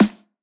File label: drum slider tick